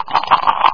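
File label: shaking sound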